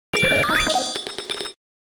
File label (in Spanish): Grito de Porygon-Z; Gritos de Pokémon de la cuarta generación